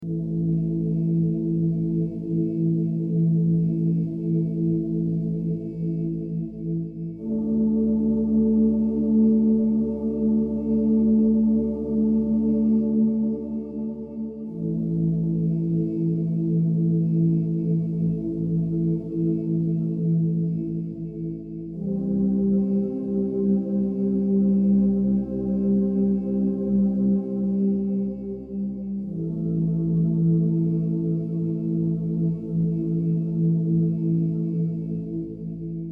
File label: Spa Zen Music, Transition; RELAX